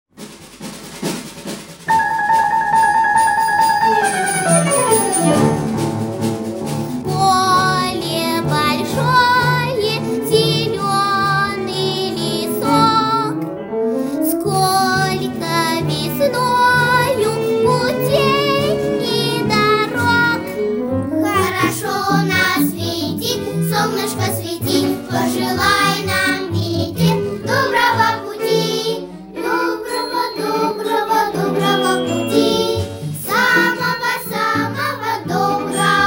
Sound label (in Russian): pesenka parovoza